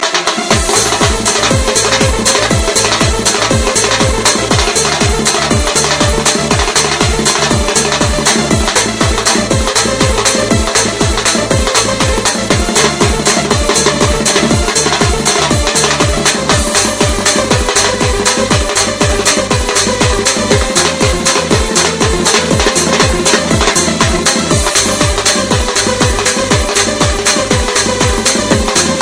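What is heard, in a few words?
Caucasian rhythm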